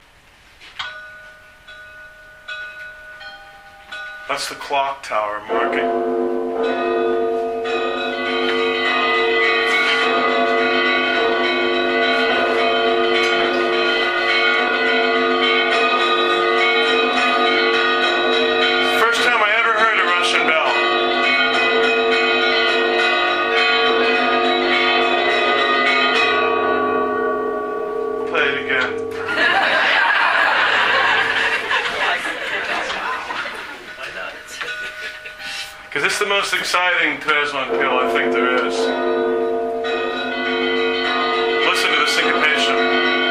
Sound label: Bell Tolls